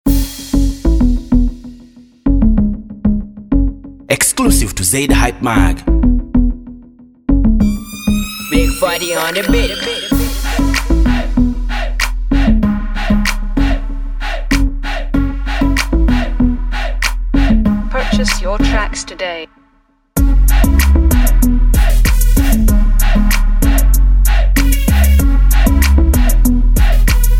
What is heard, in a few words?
Premium Beat